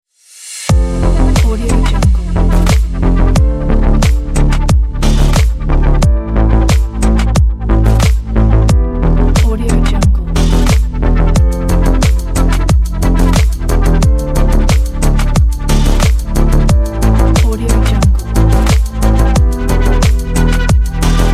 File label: electronic ambient relax chillstep